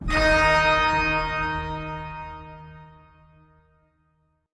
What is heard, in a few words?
Organ Chord Note D Sharp; Polluter Organ